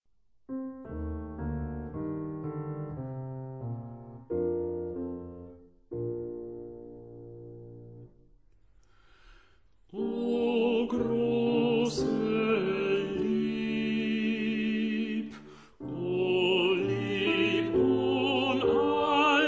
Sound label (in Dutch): O grosse Lieb tenor zang